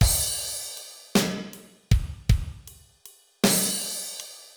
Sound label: Basic Beat Ride Crash; Pro Tools Blues